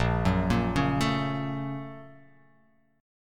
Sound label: A sharp Minor Major 7th Sharp 5th, down